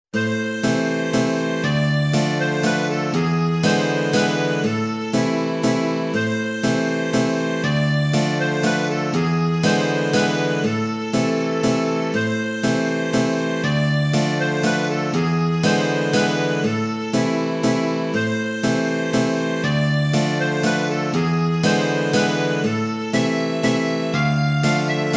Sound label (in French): valse